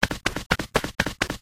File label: flap, crow; Garry's Mod; hl2